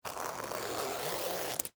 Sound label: Leather Jacket, Zipper, Up; Foley, Clothes; Dressing